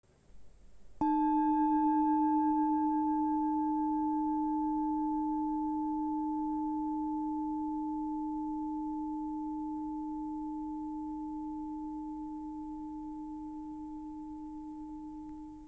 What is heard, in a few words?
d298hz